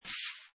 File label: Swish Leaf; Candy Land: Dora the Explorer Edition